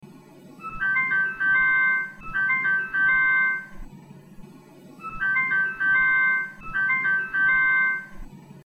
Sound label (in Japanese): 東京さくらトラム（都電荒川線）「耳にやさしいメロディーホーン」; メロディホーン